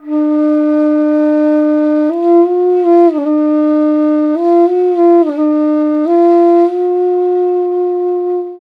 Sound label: INDIA ALTO